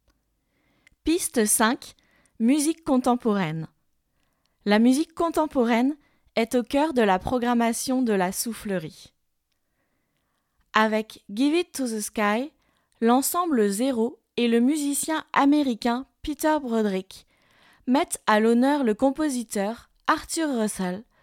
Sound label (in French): musique contemporaine